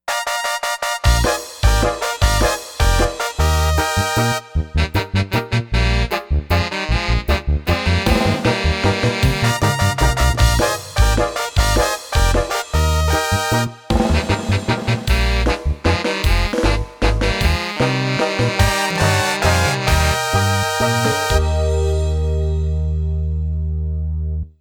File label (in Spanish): Banda 3 4